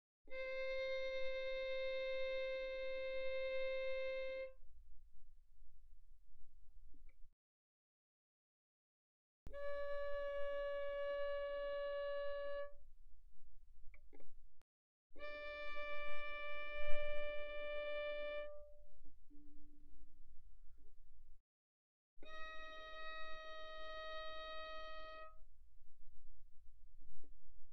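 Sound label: Violin arco pianissimo sul D C5 B5; Strings; violin2012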